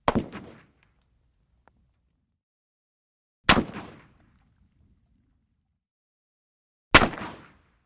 Russian SKS Single, sks 7x6

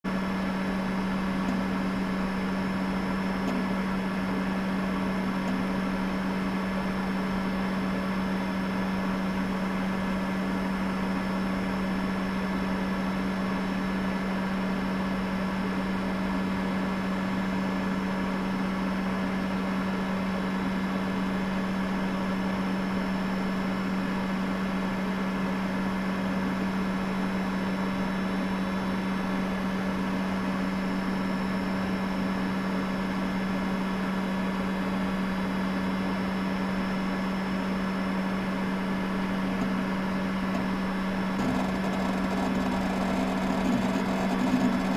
Computer Download

